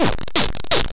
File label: death